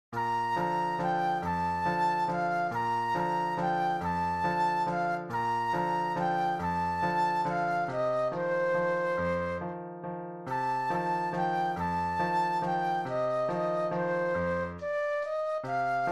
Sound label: Flute Solo